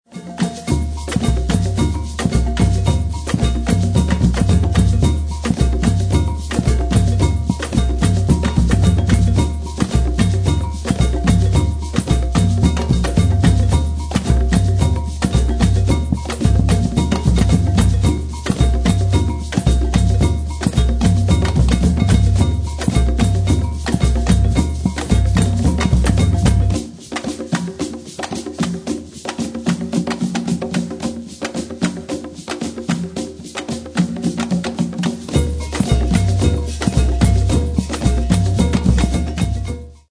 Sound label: afoxe